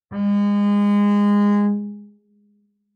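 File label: Bass arco fortissimo sul G Ab2, Double Bass; MIS Pitches - 2014; Strings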